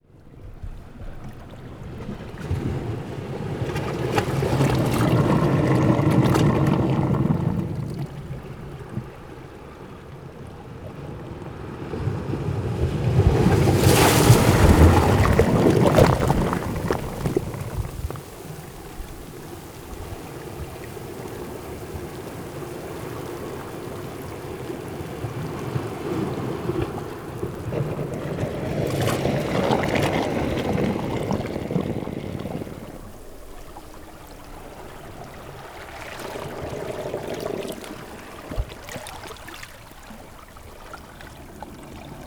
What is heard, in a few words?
Perforated rock